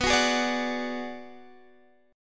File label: B-Minor 6th B down Guitar Standard; Bm6 strummed; JGuitar